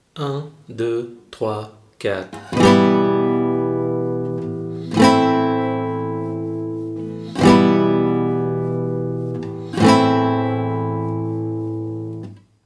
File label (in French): La guitare rythmique